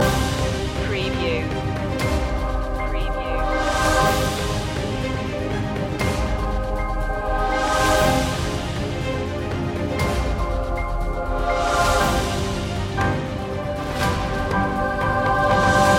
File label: last countdown loop